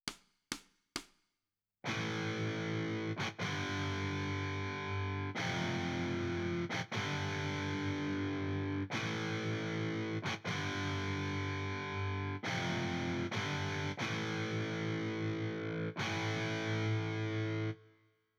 Guitar Right

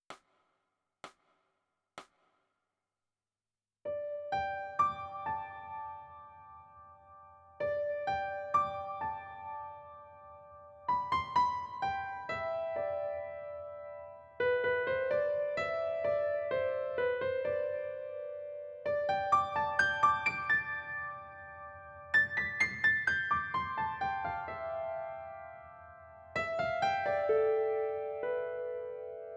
Piano

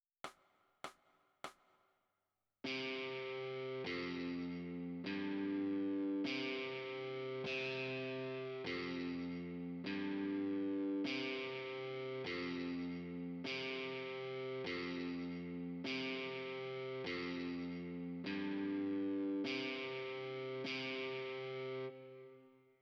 OverDrive Guitar

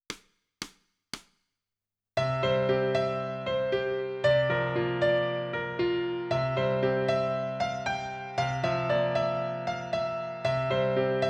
Piano

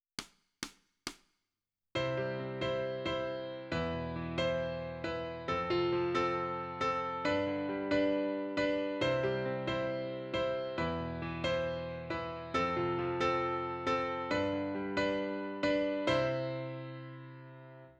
Piano

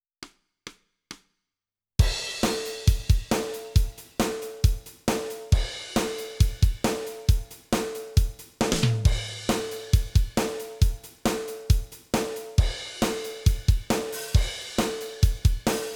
Drums

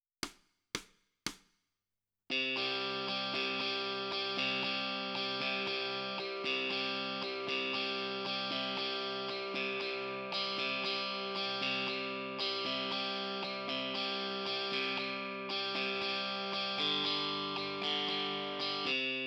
Guitar Left

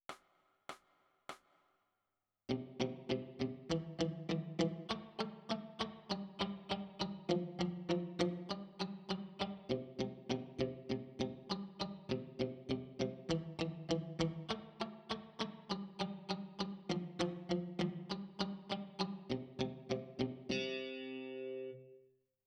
E Guitar

